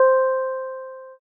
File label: piano